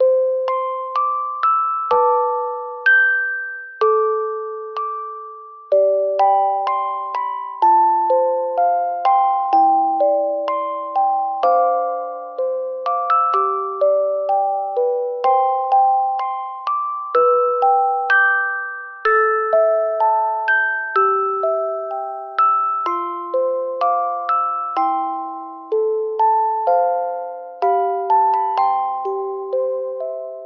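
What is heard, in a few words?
keys music box; loops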